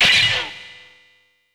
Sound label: Shield Block Break; Super Smash Bros